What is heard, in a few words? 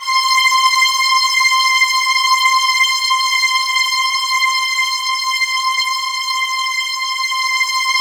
C5, Roland JV 2080 TECHNO PAD